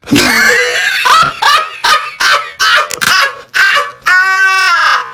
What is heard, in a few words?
laff stretch; gervais